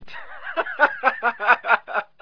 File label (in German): herzliche, Lachtyp